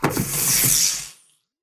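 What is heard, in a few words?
impact bullet energy titan shield arc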